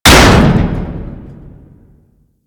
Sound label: Shipyards Crusher Low Slam; Ambience; Props; Warframe; Grineer Shipyards